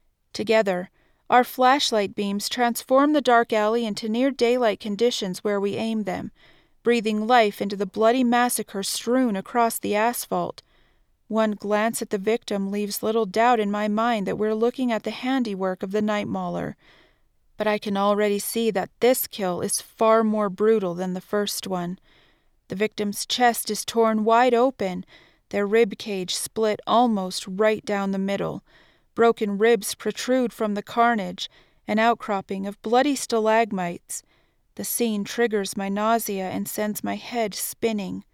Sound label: Mystery Suspense